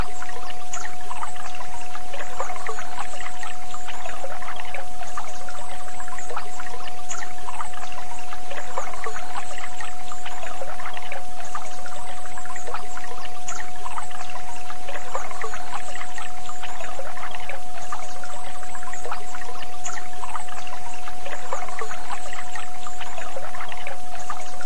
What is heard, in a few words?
World healing with stream sounds